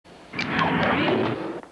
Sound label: door jammed closed